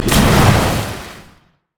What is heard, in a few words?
surging tides; nami base